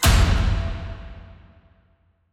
Terror Impact, Dubstep, FX; Impacts; Cymatics; Dubstep Starter Pack

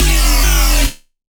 Bass X Harmonic Talk; Dubstep; Bass One Shots; Ghosthack; Dubstep Sound Arsenal; 50 Bass One Shots

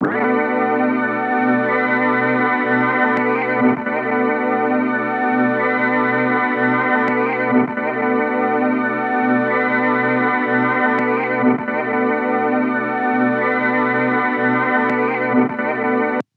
Tape Loop Pad B Min 7; Tape Loops; Pads; Cymatics - Eternity Sample Pack

